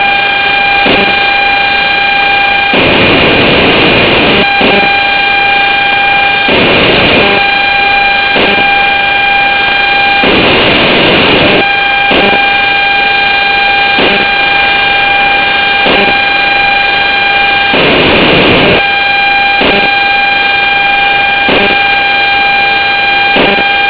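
pager, Scan samples of different Transmitter types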